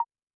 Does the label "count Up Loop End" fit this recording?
Yes